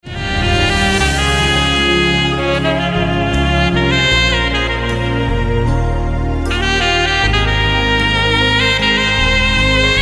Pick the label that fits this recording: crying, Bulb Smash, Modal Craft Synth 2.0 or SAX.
SAX